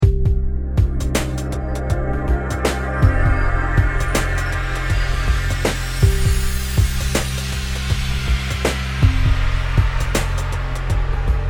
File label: beat; moh samples